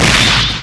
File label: Metal Heavy; CRITICAL